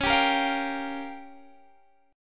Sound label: Db 6th Flat 5th, Guitar, Standard; Db6b5 strummed; JGuitar